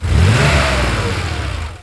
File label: COIN; SRALLY